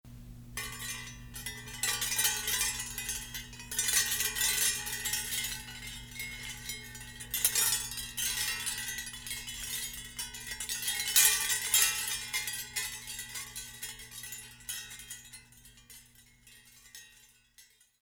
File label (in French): carillon moyen; clair de lune